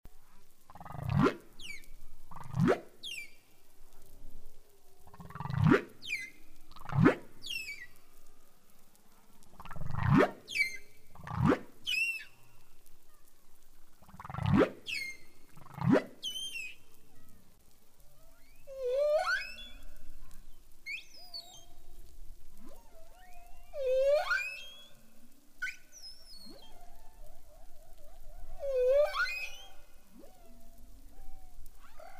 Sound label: whalesong